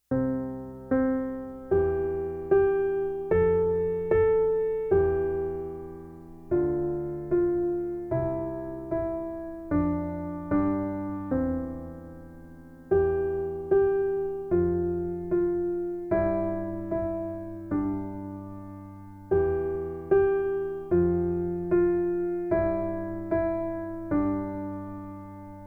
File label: Piano Version; Twinkle Twinkle; Tiny Tunes; Narrative Mind